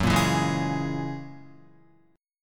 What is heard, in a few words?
Gb 9th Gb down Guitar 4F,4A,5C,5G,5C,6E; Gb9 strummed; JGuitar